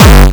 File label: Bombo Hardcore, kicks